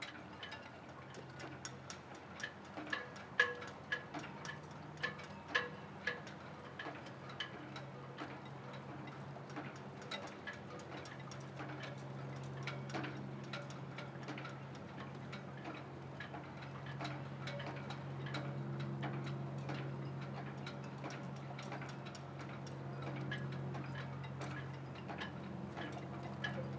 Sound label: Sailing aeroplane loopable